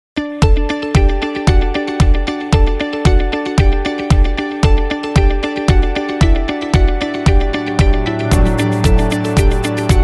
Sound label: Corporate Positive Motivation; corporate success